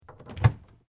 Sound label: Door Pivot Wooden Cupboard Small Open; Miscellaneous Doors